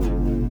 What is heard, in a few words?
LIQUITAR; Spectrasonics; Metamorphosis